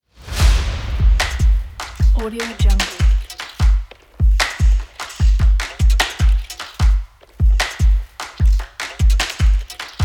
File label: the percussion